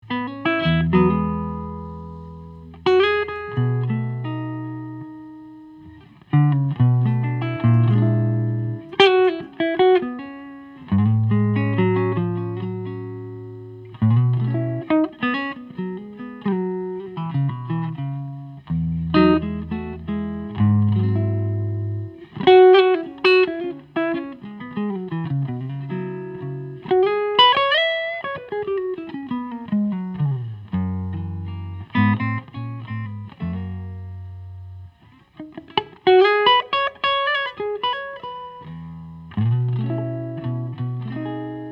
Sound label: Gibson Citation amp, electric guitars; 1984; maple spruce